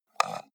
computer mouse wheel scroll fast

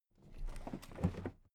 toolbox put down soft

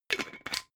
glass bottle massive close fast

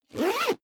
zipper backpack close fast

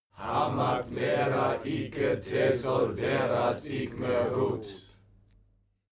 male crowd evoke

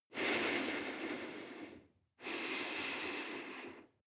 breath female nose

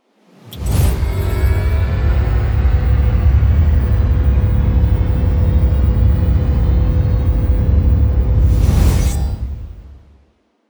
promo pad

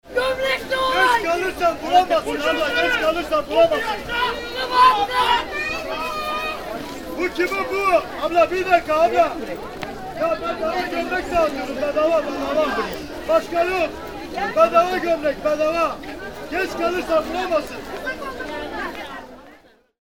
black market atmo

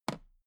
toolbox handle bounce soft

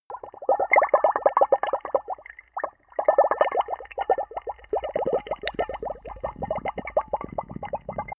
bubbles glass small

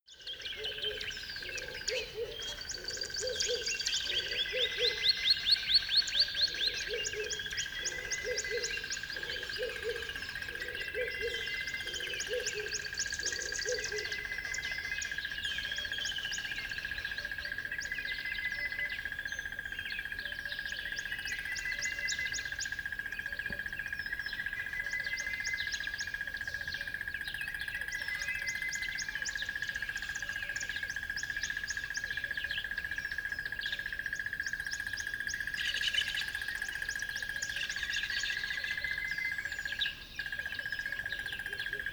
river bed dawn birds

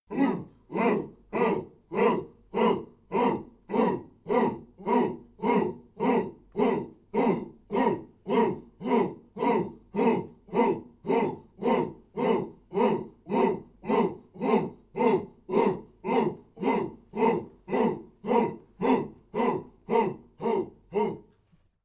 chants simple

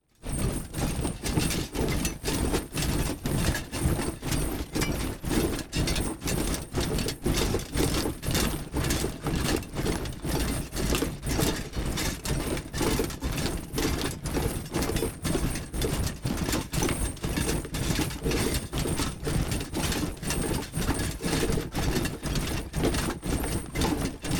troops leather metal march

